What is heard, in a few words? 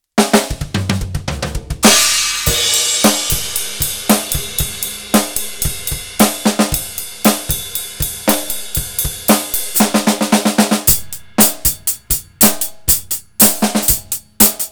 piccolo, Drums; CraftDrums